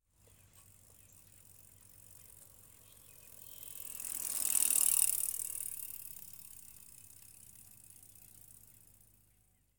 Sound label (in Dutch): Fiets Passeert; KMP Film Video Sound Effects